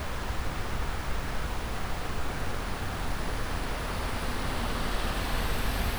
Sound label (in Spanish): ruido vehiculo, coche eléctrico; ¿Que ruido quieres que haga tu coche eléctrico?; Recarga Coches Eléctricos